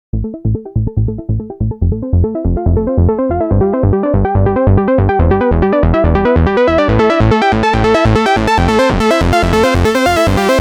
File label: delay samples